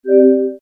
Overlay In; Microsoft Gaming App

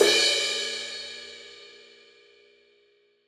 Crash, Cymbal; One Shots; Drums; Ableton Live 12 Lite; Core Library

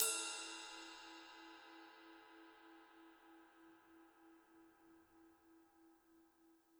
Ride Stick Mid Soft Dry Session; Drums

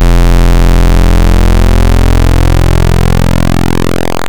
Vector Sprite Basics Pulse PW; Ableton Live 12 Lite; Sprites

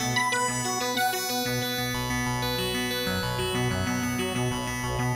SYNCHRO FUNK; Sony; ACID Pro 6.0

